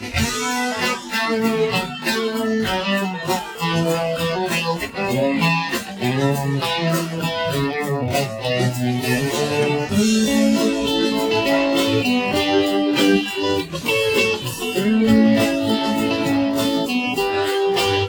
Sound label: guitar 1; sound source separation